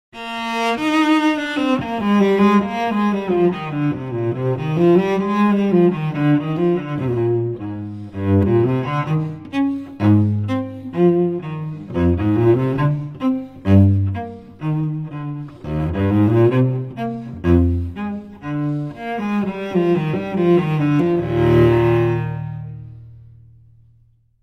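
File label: original ringtones Nokia 5310 XpressMusic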